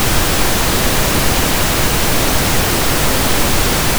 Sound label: pink noise